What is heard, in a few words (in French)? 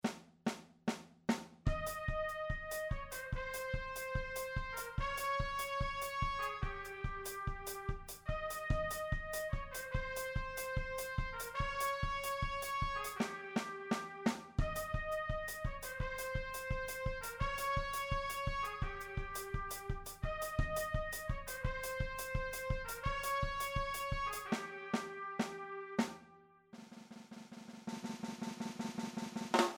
trompette fin avec acceleration